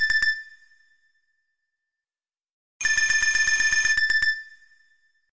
din din tone

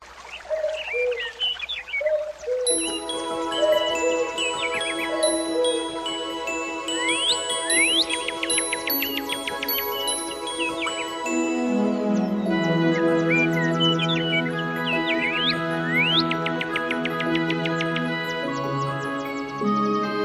morning in the forest with birds

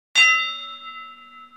tones sms

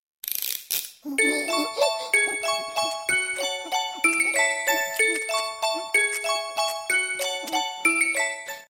ding ding ding sms

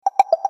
samsung on time

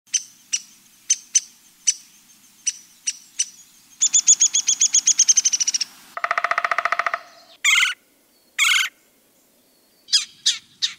woodpeckers

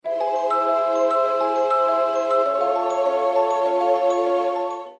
potion sms

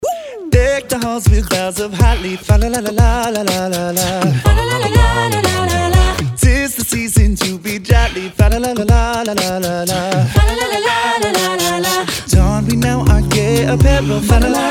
deck the halls

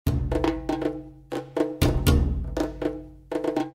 arabisch sound sms

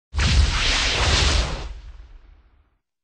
lightning zap sms

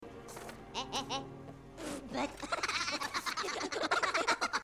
minions ahahah

